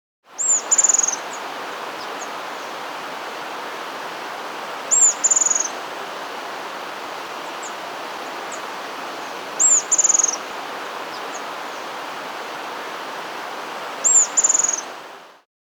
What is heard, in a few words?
Ashy-Headed Tyrannulet (Phyllomyias Cinereiceps), Andean Birds; S. America